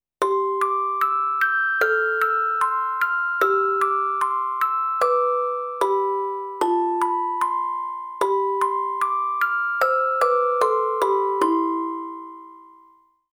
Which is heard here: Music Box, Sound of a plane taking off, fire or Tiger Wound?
Music Box